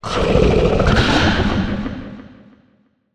Low Roar Short, Monster Sounds; The Decline Of Warriors; Monster Sounds Volume II

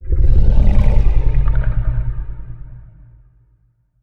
Alien Distant, Monster Sounds; TheDeclineOfWarriors; Monster Sounds Volume II

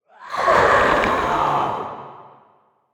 Zombie Agressive, Monster Sounds; The Decline Of Warriors; Monster Sounds Volume II

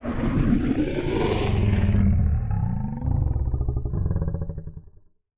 Feline Distant Roar; The Decline Of Warriors; Monster Sounds Volume II

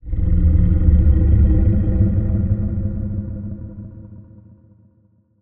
Low Roar Distant, Monster Sounds; The Decline Of Warriors; Monster Sounds Volume II

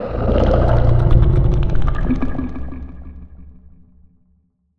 Low Roar Distant, Monster Sounds; TheDeclineOfWarriors; Monster Sounds Volume II

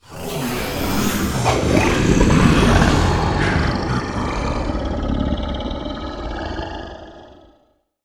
Digital Creature Transformation, Monster Sounds; The Decline Of Warriors; Monster Sounds Volume II

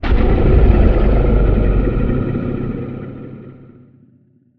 Low Roar Strong, Monster Sounds; TheDeclineOfWarriors; Monster Sounds Volume II

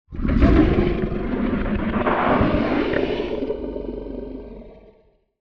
Feline Roar Breath, Monster Sounds; The Decline Of Warriors; Monster Sounds Volume II

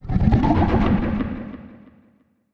Low Roar Short; The Decline Of Warriors; Monster Sounds Volume II

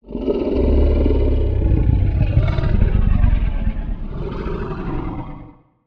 Feline Long Roar; The Decline Of Warriors; Monster Sounds Volume II

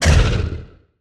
Humanoid Impactful, Monster Sounds; The Decline Of Warriors; Monster Sounds Volume II

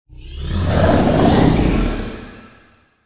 Digital Creature Pitch Up, Monster Sounds; The Decline Of Warriors; Monster Sounds Volume II

